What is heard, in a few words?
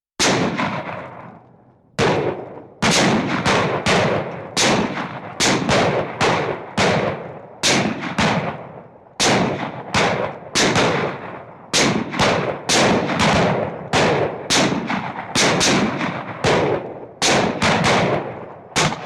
Semi-Automatic Gunshots